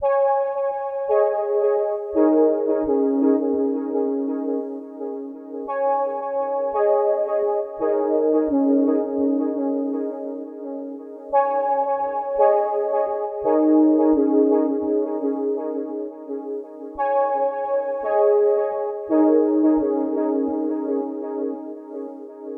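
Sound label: 170 D minor dreamy synth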